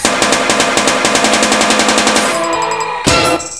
DRUM

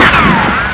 RICOCHET

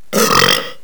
URP

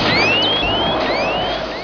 MISFIRE

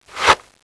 MOVE OK

